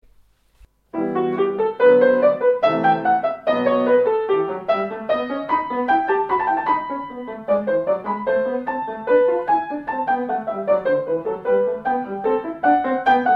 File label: Air